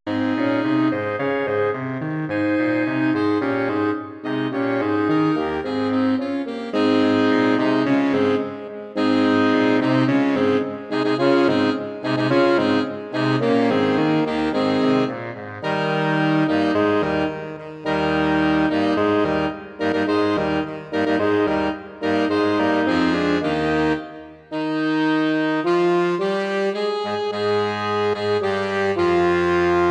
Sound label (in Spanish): quinteto saxos; Handel; Aleluya; Christmas Bells